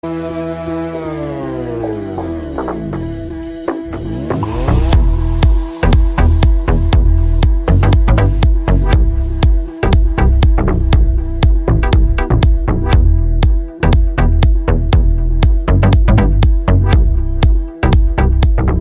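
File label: dubstep ring tone; iphone 8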